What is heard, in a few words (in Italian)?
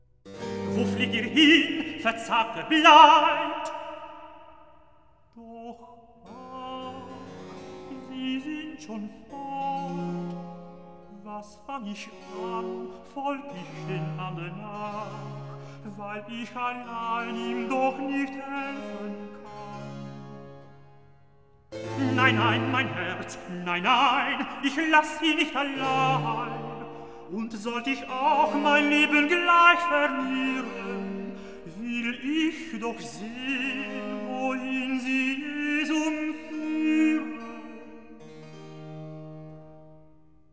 Recitativo; Wo flieht ihr hin; Handel; Brockes Passion